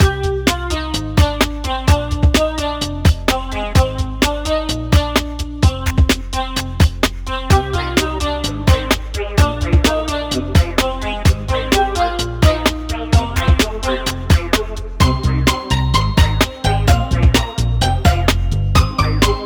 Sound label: Loop